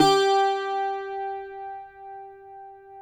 CUATRO; Spectrasonics; Hans Zimmer Guitars VOL-2

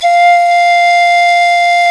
pan flute; Sound & Vision - Gigapack I CD 2 (Roland); Brass&Woods2

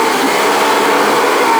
A#4 WINDY; Northstar - The Wizard VOL-1; VERTIGO

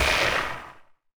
X MACH, MACHINES, Production Effects; Best Service - XXL Production Effects

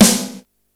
snare, Yamaha RY-8

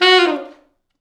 T Sax falls, Horns Sax

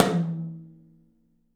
TOM; 3D Sound; 3D Drums

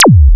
Chunk, Drums, Industrial; Sonic Foundry (Sony Creative Software)

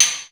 INDUSTRY, drum; Crypton - DrumBase5000

